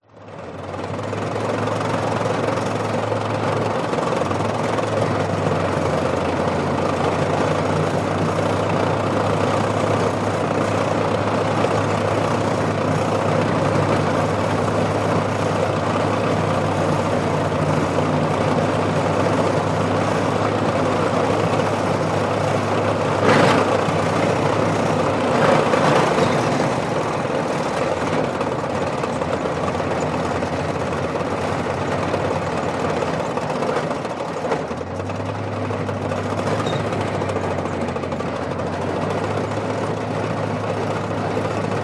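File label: warfare tank matilda mark 2 1939 drive across field slow to stop onboard exterior front; front facing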